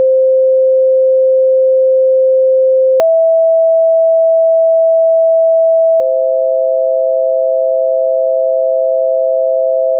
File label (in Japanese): ド-ミ(純正律純音); 実際に平均律と純正律の音の違いを体験してみましょう。; classical music